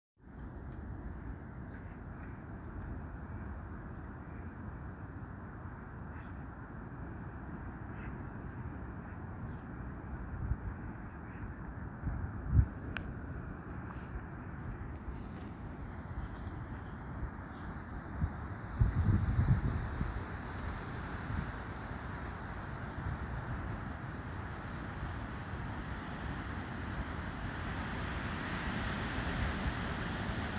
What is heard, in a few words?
wind